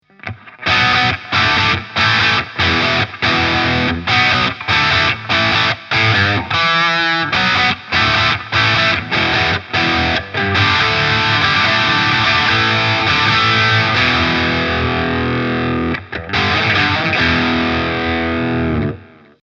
Off Gain 10h

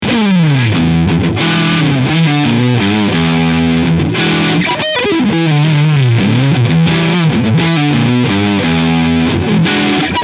Leadsound Front

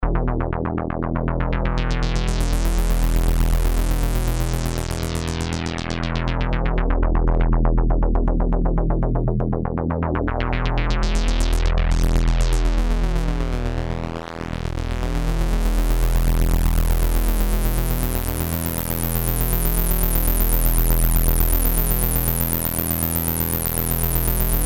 filter

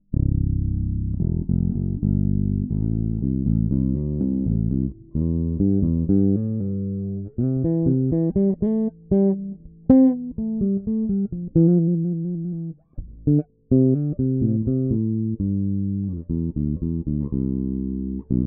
Bridge Pickup Tone closed

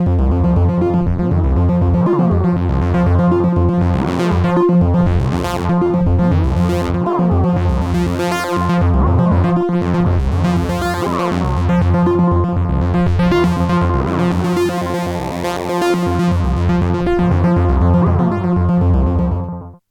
Synth LoPass Delay delay time feedback; Erica Synths Black Hole DSP2

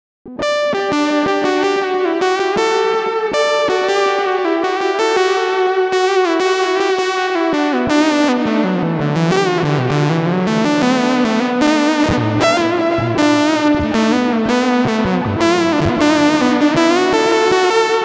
Lead; Neural DSP Archetype Rabea; Black Gummy

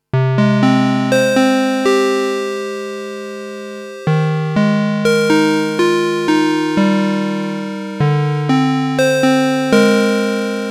Juno 60 Melody trocken

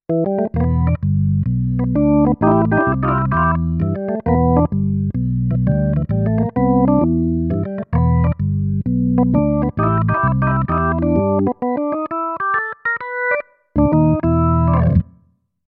Kawai ES8 Drawbar Organ

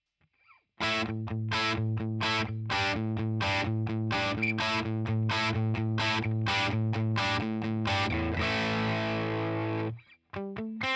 6V6 DIST; Boss Nextone

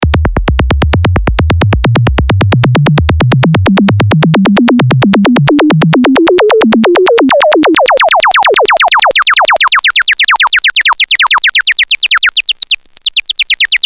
Reso Percussion

